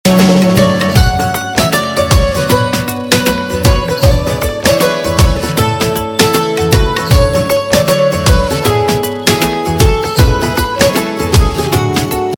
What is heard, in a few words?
Instrumental Tone